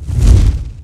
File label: Flames; MexicanaChillitime; ClubFusion